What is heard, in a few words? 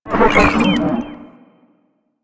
Moa Investigate, Enemies Vocals; Warframe